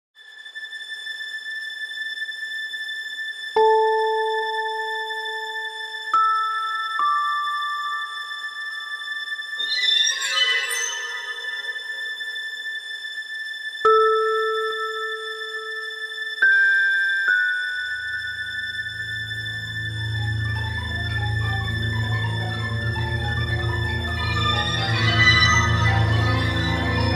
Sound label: sound track horror